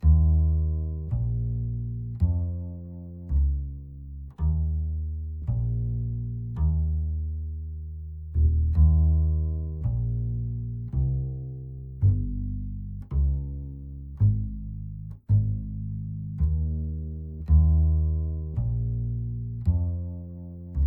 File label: Bass; Toki Tori 2